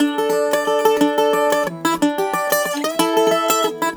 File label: Celtic Flavours; USB Soundscan vol.42; AKAI